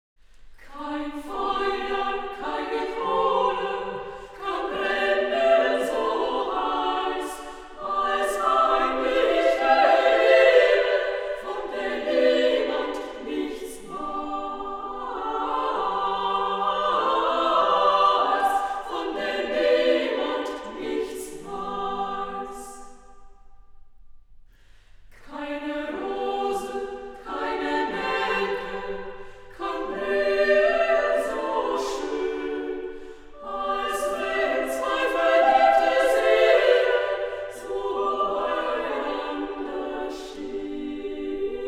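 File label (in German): Volkslieder für 3 4 stimmigen Frauenchor Heimliche Liebe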